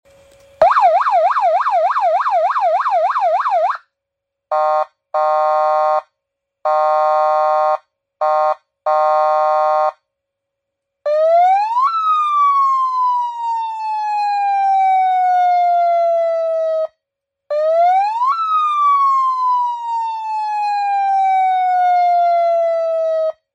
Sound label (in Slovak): siréna megafón 12v 3 tóny a mikrofón